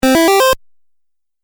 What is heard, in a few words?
Beeps Video Game